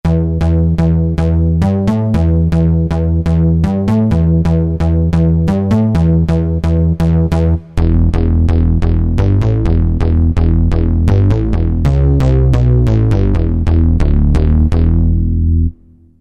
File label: Synth Bass